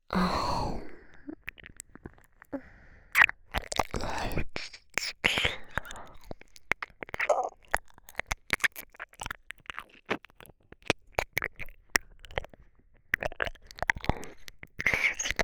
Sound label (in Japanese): 耳舐め（右）